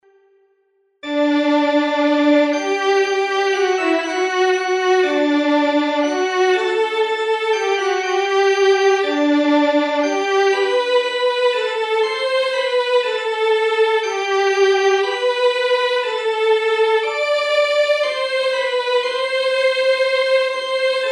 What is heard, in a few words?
strings organ; lieder